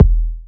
Bassdrums

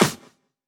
Claps & Snares

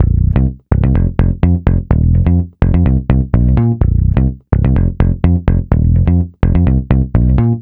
Root C 126BPM; Vengeance Samples; VEH3 Special Sounds

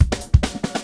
Loops Fillin140; Vengeance Samples; VEC1 Fills

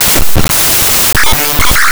125 c, guitar licks; PS-28 Vocal House Samples; instrumentloops

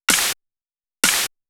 Clap snare, 128 BPM; Vengeance Samples; Vocal-Hook Kits; Song Kit

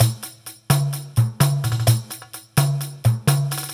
Percussive Loops 128BPM; Vengeance Samples

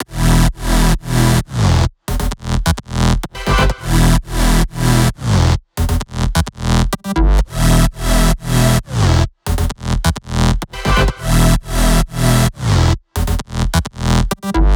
Machine Synths Root E, 128BPM; Vengeance Samples; Machine Kit

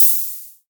Ride, Cymbals; Vengeance Samples

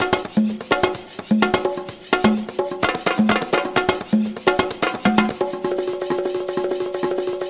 Latin House Loop 128 BPM; Vengeance Samples

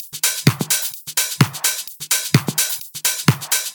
Minimal Loops

